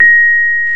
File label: PNO, Rhodes Mk.V; Keyboards of The 60's and 70's